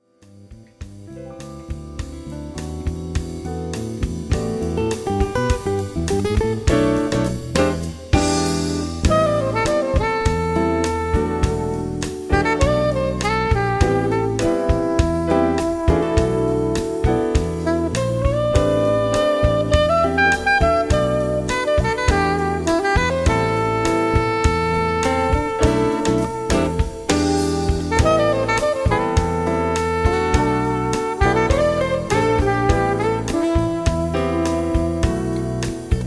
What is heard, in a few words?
Melancholic Samba; Frauenlob